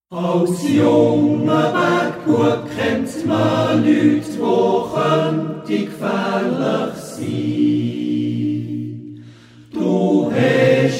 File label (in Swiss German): Uf em Läbeswäg (Chor)